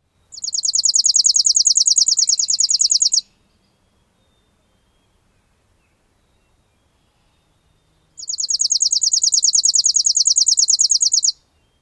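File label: Chipping Sparrow slow-pulsed song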